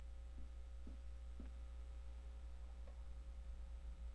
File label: jungle